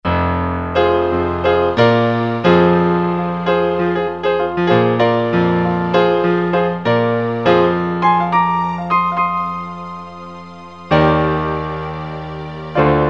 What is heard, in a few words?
Piano